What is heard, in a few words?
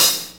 HAT